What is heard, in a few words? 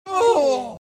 Mom Vox Hurt; The Binding of Isaac